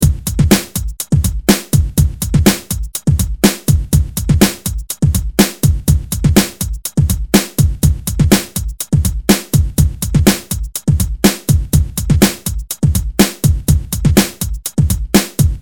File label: 123bpm beat; nukearts